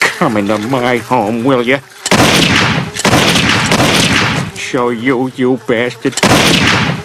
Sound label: house